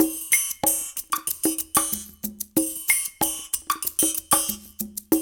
DNCE, World Dance, Dance Coil; Spectrasonics; Supreme Beats

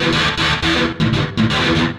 GTR LOOPS

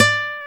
NOTE, Acoustic; Sound & Vision; Gigapack I CD 2; GUI ACOUST.; GUI Acoustic 1

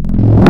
Spectral Growl, wavetables; Spectral Growl Wavetables Vol 1

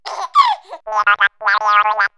Comedy Baby Android, vox; vox mix; X-Static Goldmine

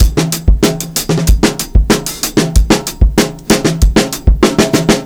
Live Beats, R&B Drums

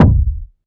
TOMPPA KICK

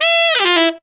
TEN ESF, Phantom Horns TENOR FX; Zero-G

